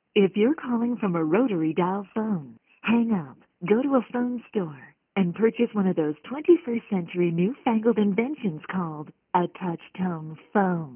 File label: touchtone